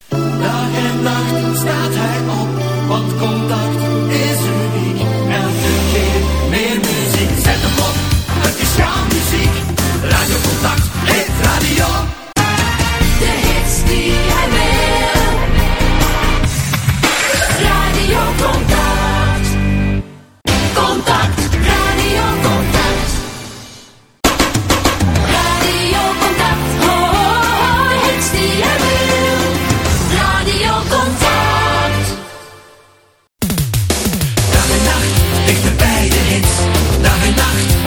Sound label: Jingles; Contact